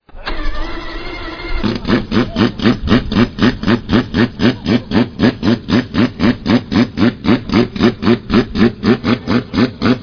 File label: mazda 787b